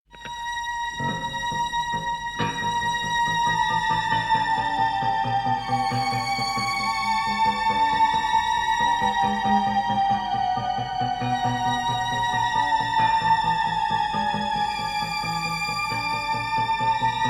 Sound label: Sci Fi Tension